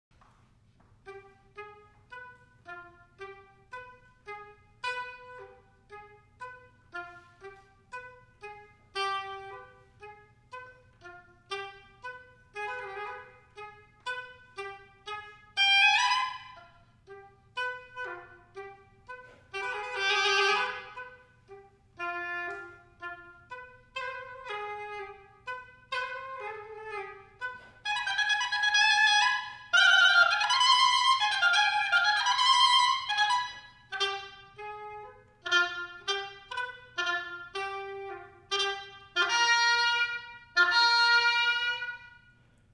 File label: galop